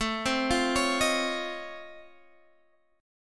Db Augmented Major 9th, A

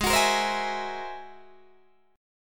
G sharp Augmented 9th G sharp Guitar Open D

